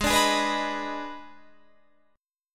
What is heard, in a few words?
D sharp Major 7th Suspended 4th Sharp 5th, G sharp